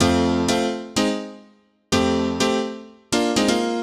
House Piano (125BPM), retro house

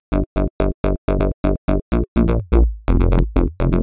elsynbassg 125, synth loop; Zero-G; Soundclash; Nu Dance Electro

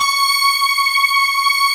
STRATOS G5, M-1; Greytsounds VOL-1

